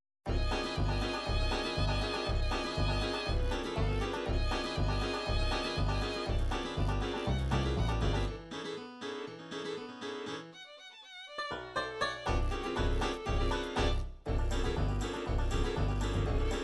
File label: bluegrass, midi